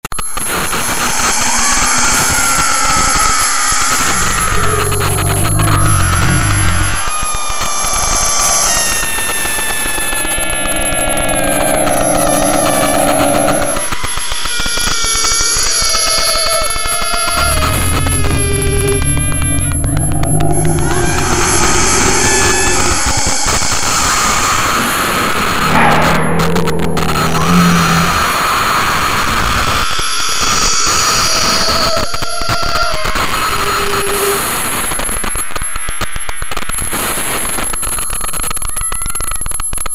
strashnye zvuki; устрашающие звуки из фильмов ужасов

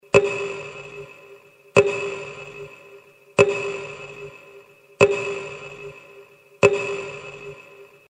taimer 5 sec

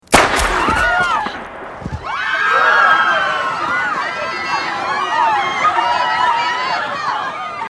vystrel i kriki tolpy; zvuki ubijstva i trupa

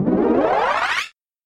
zvuk uskoreniya

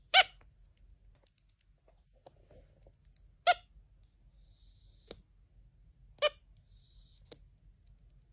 belka letiaga

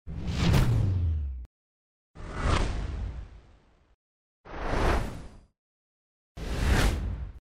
perehody zoom effect

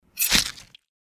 udar nojom

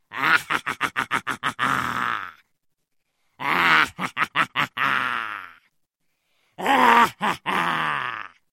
kovarnyi smex zlodeya

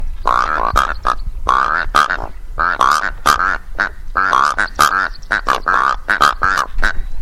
drofa

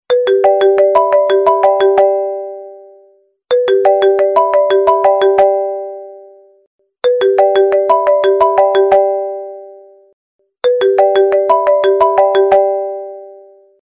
originalnyi rington iphone

